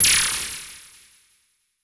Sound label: CABL TEN, CABL TENS; Total Control - Action Cutz